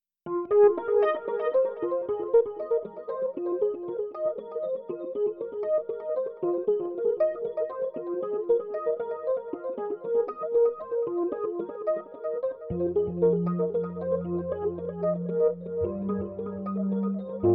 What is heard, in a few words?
delay trickies